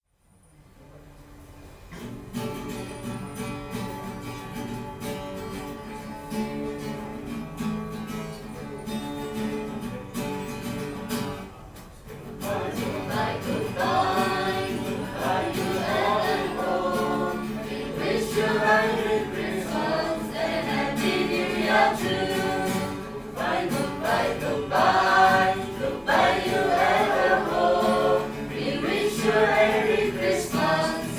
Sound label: kachin xmas